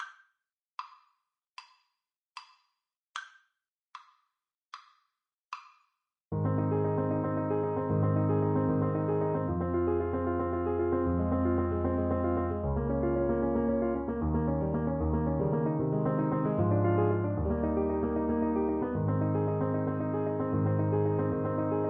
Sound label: French Horn